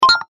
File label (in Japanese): ゲームのボタン音